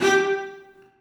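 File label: celli staccato f#2; Sonatina Symphonic Orchestra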